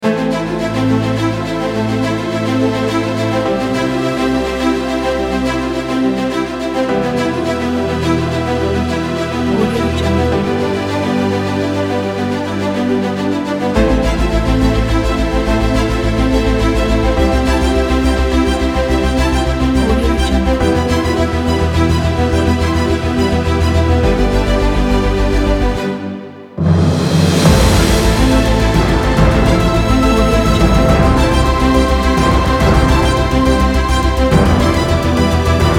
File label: cinematic uplifting